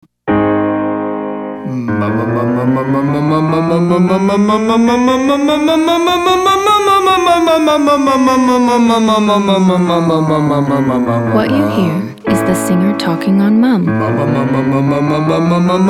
Muh chromatic male

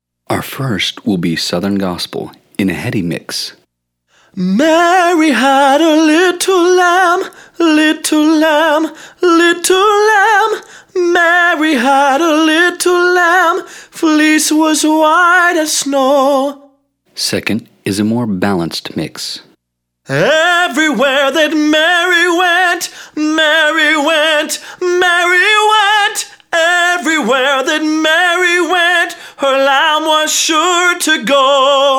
Exercise Mix Examples male

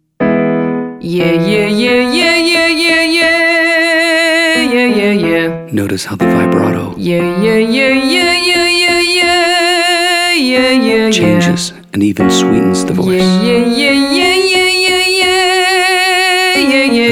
using vibrato female

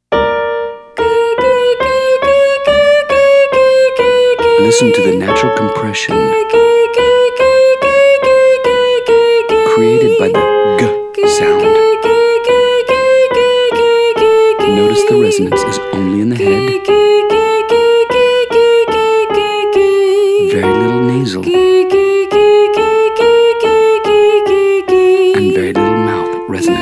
Gee Gee Gee Female Male; Singing

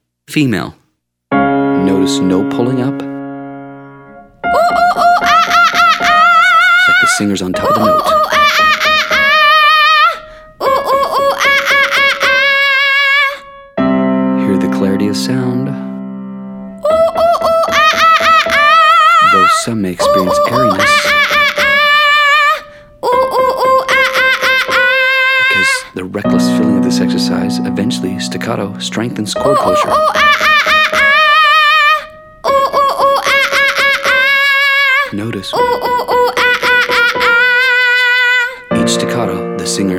Exercise Monkey Female

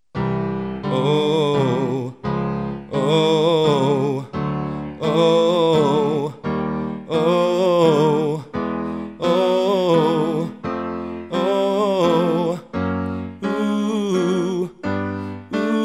Exercise Note bend fall off

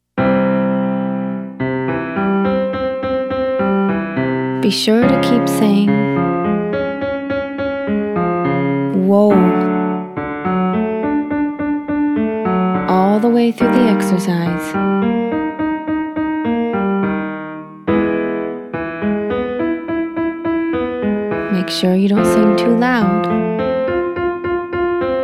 Exercise Your turn Male

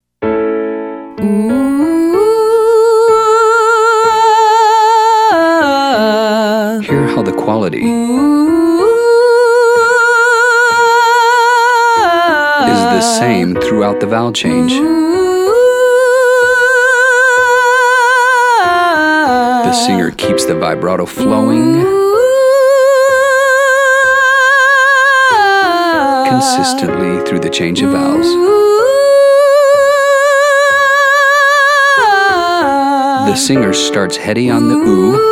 Exercise oo to uh female; Singing

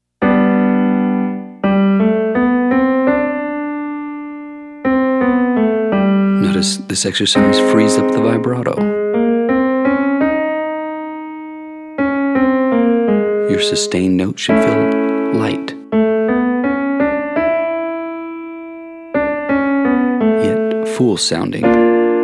Exercise ONS Female

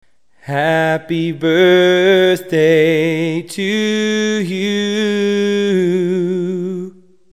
Happy Birthday with fall off at end

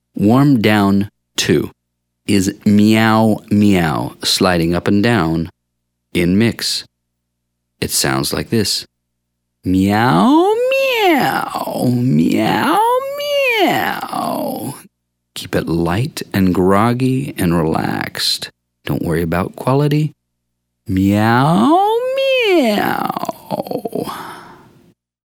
warmdown Meow males; Singing

